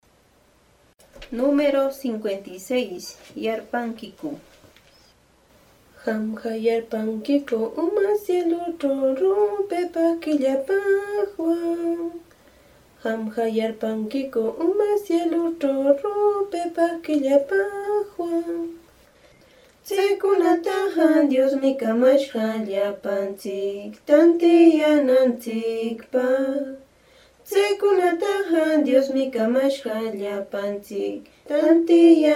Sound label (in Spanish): cancion